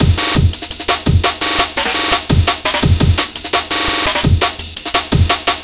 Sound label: 170 break